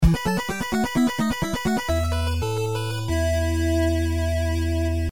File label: Game over; Castlevania 2 - Simon's Quest